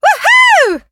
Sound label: Voice attack